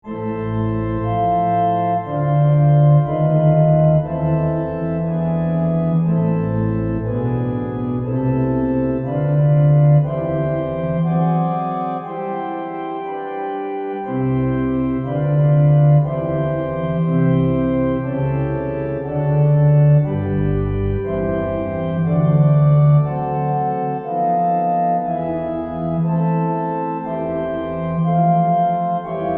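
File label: French Augmented 6th Exercise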